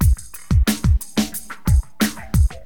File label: DM LOOP, HipHop, Drums; LiveAct Pool